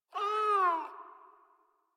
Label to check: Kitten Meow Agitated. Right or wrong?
Right